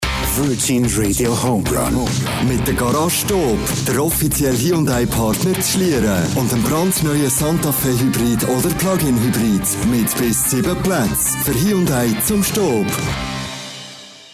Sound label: STINGER Sponsoring; HOMERUN; Garage Stoop; virgin radio switzerland